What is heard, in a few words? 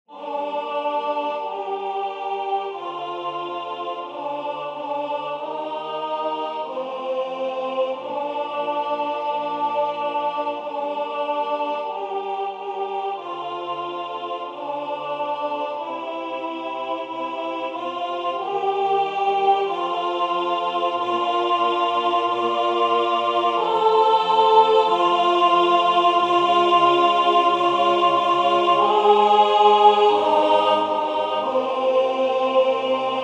alto; Totus tuus